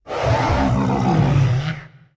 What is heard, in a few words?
Dinosaur Design, Vocals - Monster